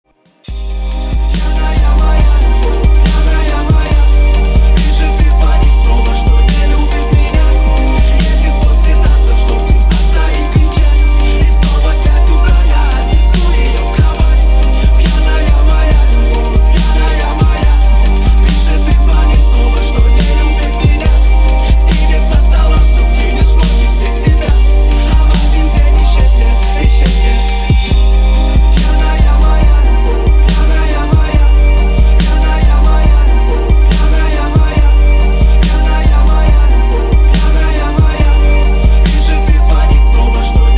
trap remix

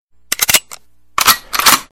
sms ak 47

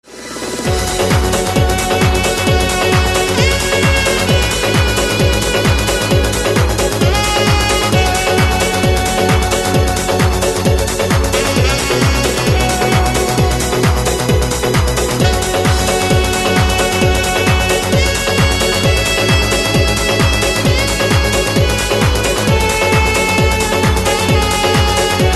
the horn

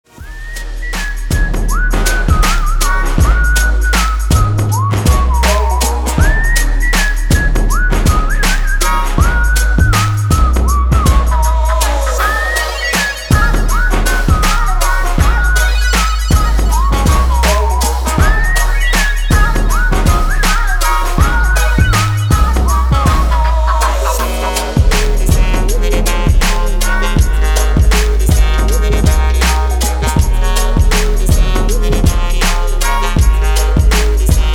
remix style electronic

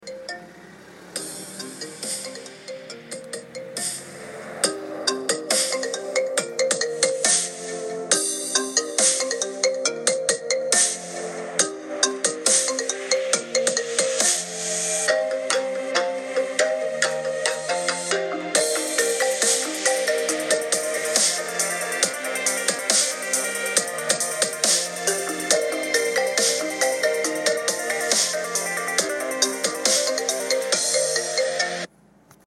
marimba remix

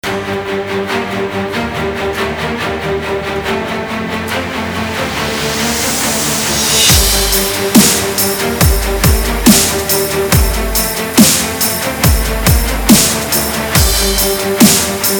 instrumental core

